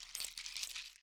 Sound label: WALK